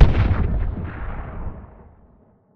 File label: rocket explosion far; Titanfall 2